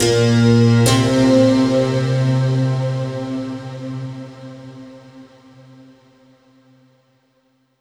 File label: Overlay Tone; Act3 Rezoning